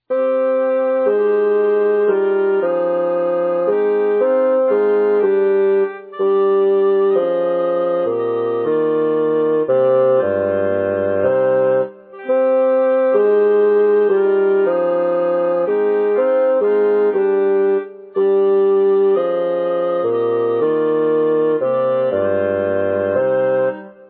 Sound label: bass; pfautsch